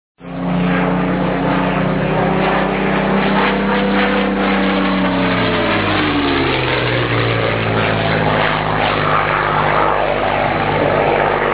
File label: spitfire